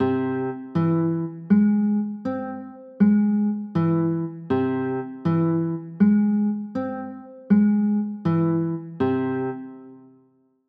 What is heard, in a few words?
a flat aug, chords